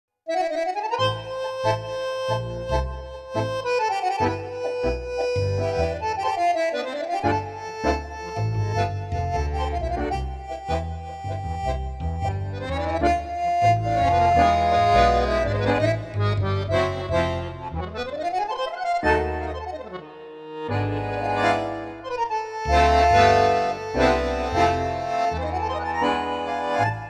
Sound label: Bandoneon, Roland V-Accordion, tango